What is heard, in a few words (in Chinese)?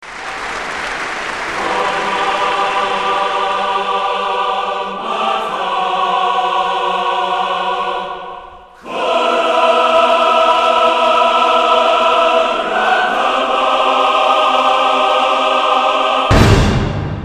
电视包装音乐